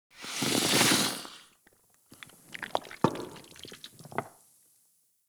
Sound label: Ext Goop Thick Burst; Warframe; Infested Eris